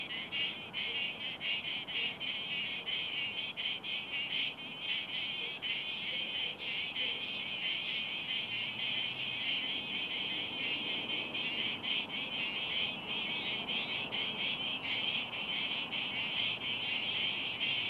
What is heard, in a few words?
Swamp, Samplw